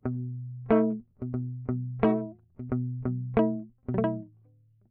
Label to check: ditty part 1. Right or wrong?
Right